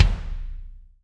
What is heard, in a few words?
BD, DR BD; Best Service Gigapack 2